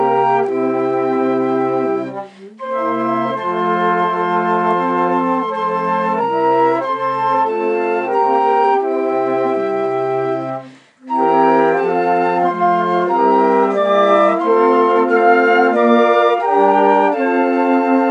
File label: Sweet Christmas Bell